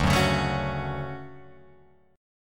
D Major 7th, Guitar, Open F; strummed; JGuitar

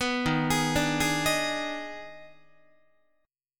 D sharp Major 7th Suspended 4th Sharp 5th, Guitar; arpeggiated; D#M7sus4#5; 14,11,11,12,12,14; JGuitar

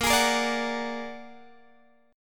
Bb Augmented 7th Bb down Guitar Open G

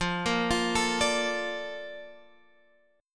A sharp Major 7th F

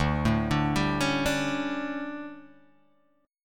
D Major 7th Suspended 4th, Guitar, Open G

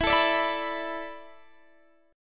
E 7th Suspended 4th, Guitar, Standard; E7sus4 strummed; x,19,19,19,17,19; JGuitar

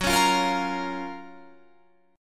F sharp Major 7th

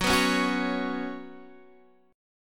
F sharp Suspended 4th, guitar; F#sus4 strummed; 14,14,11,11,14,x; chord shape

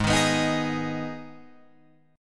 G sharp Minor G sharp down Guitar Open F, chord; G#m strummed; JGuitar

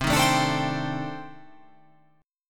C Minor 6th Add 9th C

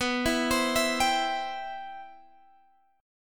C Major 7th, B, guitar chord

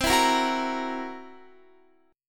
C 7th Flat 5th C down Guitar; C7b5 strummed

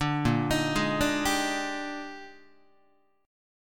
Bb 13th Bb Guitar, chord; 5,6,6,6,6,6; 4A,4E,5A,5D,5Gsharp,6Csharp; Bb13 arpeggiated; JGuitar

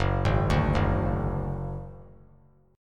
Gb Suspended 2nd Sharp 5th 3C,3F,3A,3D; Gbsus2#5 arpeggiated

